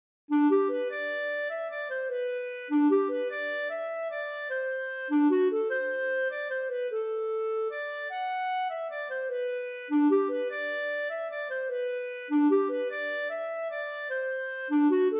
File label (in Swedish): Vals; fran gammelgarde